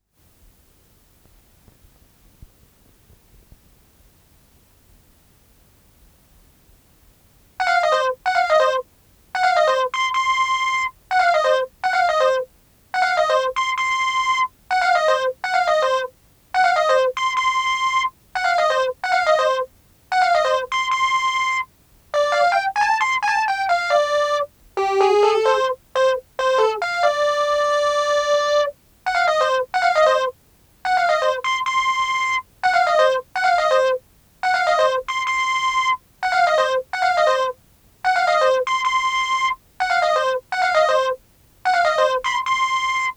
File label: Reface CS Lead